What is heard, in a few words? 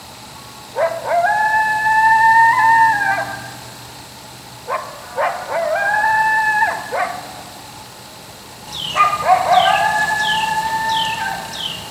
coyote howl